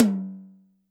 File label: Tom Hard, YAMAHA RM1X